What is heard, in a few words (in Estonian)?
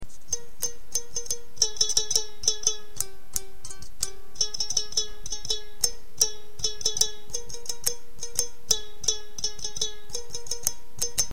kannel